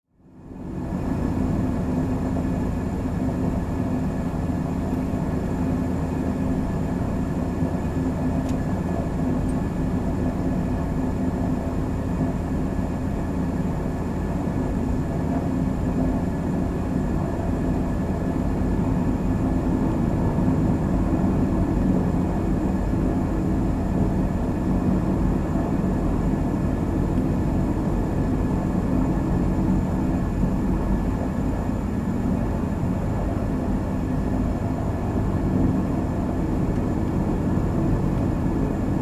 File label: Airliner Cabin Ambience